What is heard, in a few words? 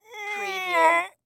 Moan Male